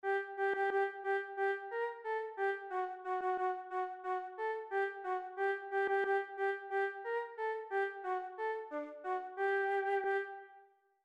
Minka, flute solos

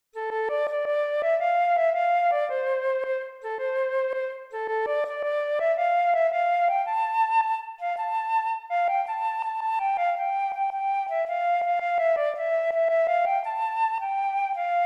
When Johnny Comes Marching Home, flute solos

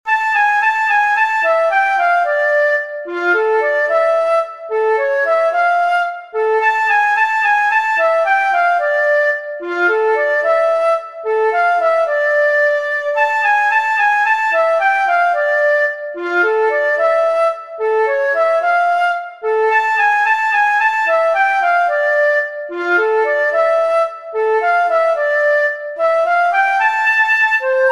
Fur Elise Key Of D minor, flute; sheet music

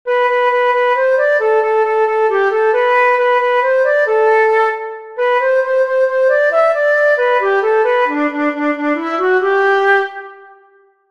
Hey Diddle Diddle, flute solos

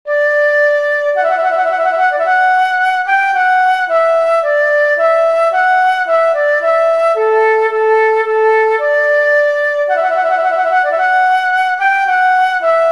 Trumpet Voluntary No. 2, flute

